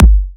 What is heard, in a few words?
Kick